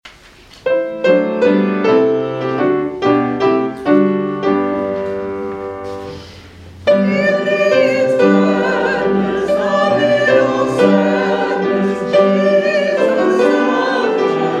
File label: Hymn; In Thee Is Gladness